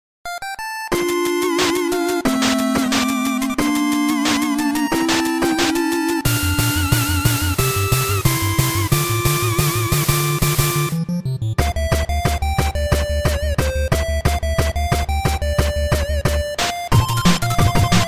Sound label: byte size bleeps synthesized